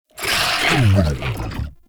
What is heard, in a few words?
Infested Mercy Shotgun Idle One Off, Weapons